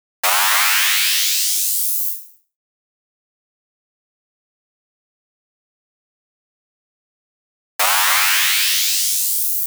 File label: 128 Dm Sfx, Electro Synths; Prime Loops; Complex Electro Synths; Deus